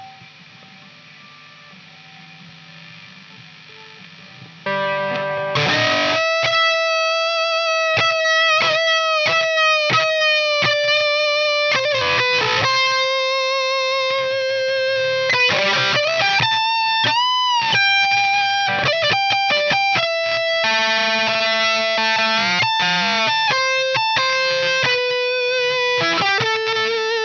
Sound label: Chorus Gtr